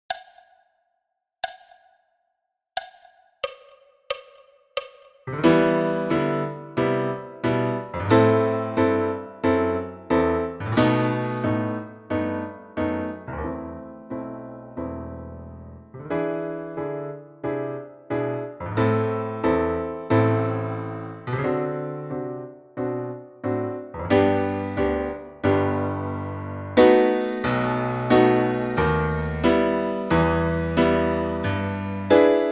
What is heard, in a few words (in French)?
Piano clic intro a 90 bpm